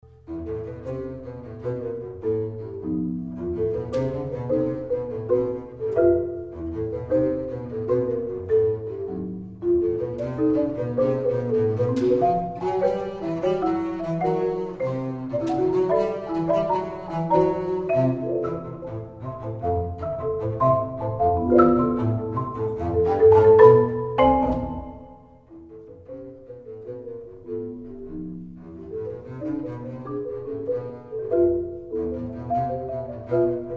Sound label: bassarimba